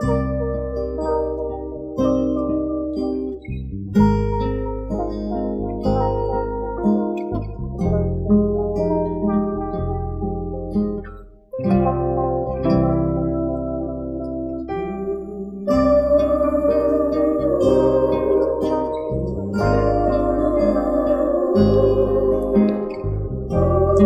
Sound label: guitar spooky, Loops